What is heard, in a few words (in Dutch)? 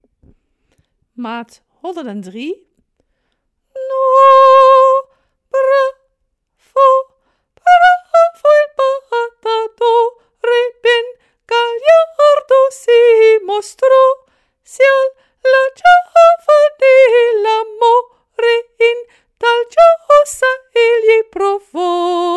S T maat 103 t/m 119; koorleden